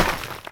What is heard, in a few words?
dirt